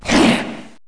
attack zombie

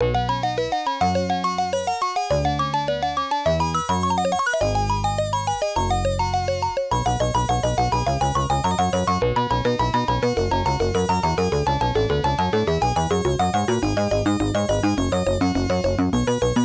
Unused BGM 2 Faster; Valtric; arcade

